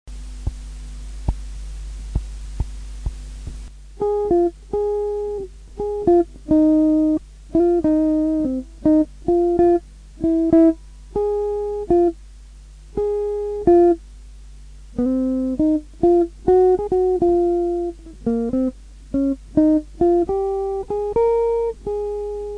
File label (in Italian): blues tema